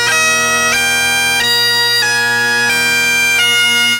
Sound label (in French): Biniou